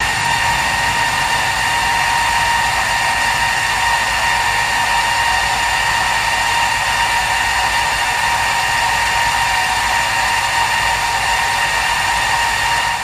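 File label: Petroleum Plant Whine, factory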